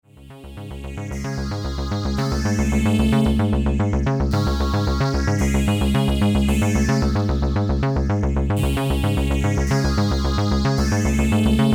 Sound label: ELKA 610 thru 1640